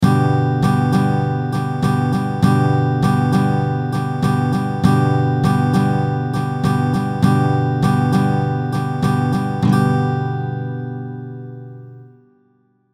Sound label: stroke G, guitar